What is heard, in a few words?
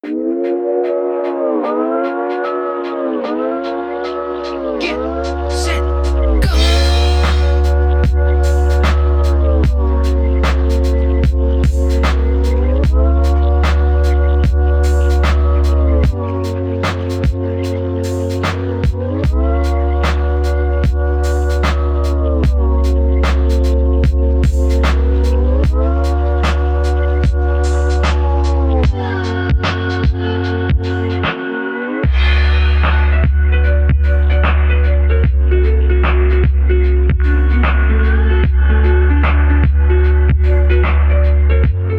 Lo-fi Hip-Hop Beats; Rhythm Doctor OST